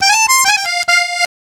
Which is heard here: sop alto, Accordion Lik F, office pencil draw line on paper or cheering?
Accordion Lik F